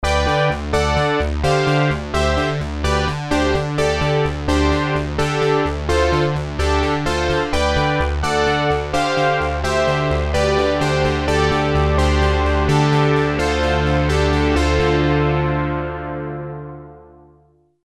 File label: 80S POLY BRASS; Redshift 6